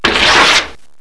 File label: TEAR